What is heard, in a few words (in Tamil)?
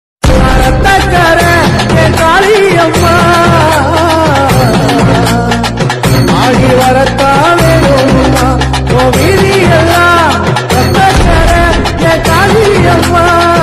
amman song tamil; kaliamman